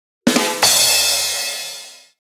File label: drumroll